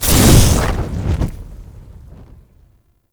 Fire Torch Burst; Ambience; Props; Warframe; Tau; Circles Of Hell

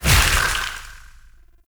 Void Release Heavy; Duviri; Incarnon Adapters; Weapons

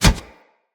Step Metal, Footsteps; Kubrow

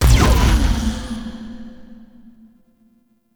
Corruption UI Debuff; Warframe; Missions; Zariman

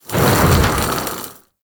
Spit Attack Projectile By; Warframe; The New War; Archon; Nira; Abilities

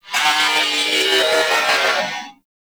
Purgatory Warrior Possess Scream; Warframe

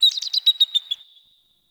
tree critters; Ambience; Warframe; Eidolon Plains

